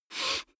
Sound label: nose sniff male soft; koerpergeraeusche; menschen; soundpool